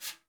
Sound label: flick; Northstar - New Gold VOL-2; 30S BRUSH